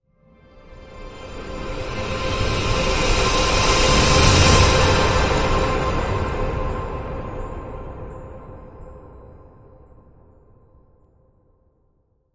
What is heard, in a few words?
Wish Granted Transition